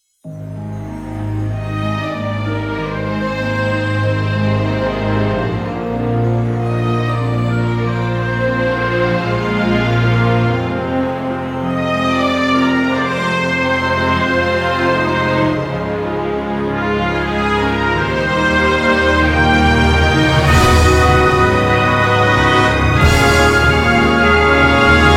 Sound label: Jingle Mystery Solved; sonic frontiers original soundtrack stillness and motion